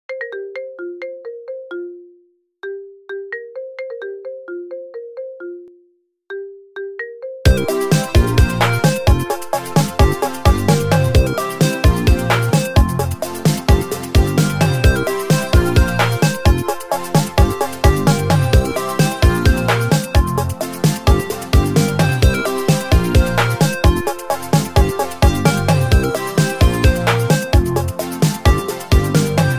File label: 80s piece of music with iphone ringtone, iphone ringtone goes 80s